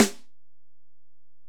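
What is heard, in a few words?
Acoustic Snare, Alesis DM-PRO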